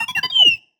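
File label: Sci-Fi Droid Robot Emote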